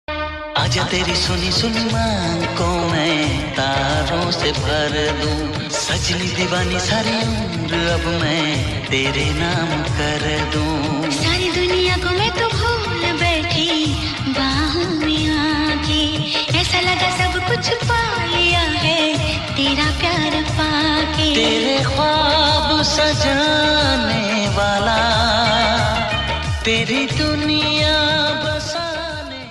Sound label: Old Song Ringtone